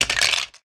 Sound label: many bones; Crab